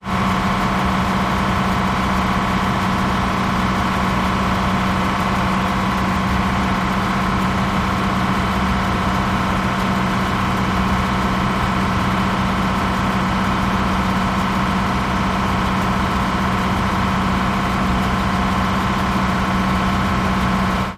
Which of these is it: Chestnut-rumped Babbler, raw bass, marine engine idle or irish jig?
marine engine idle